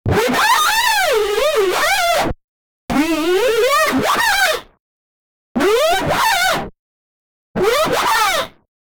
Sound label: discrete but satisfying, click